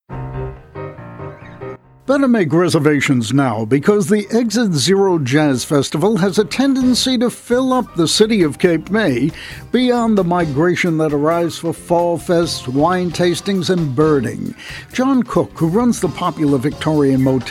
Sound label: Jazz; Exit Zero Jazz